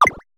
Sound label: collect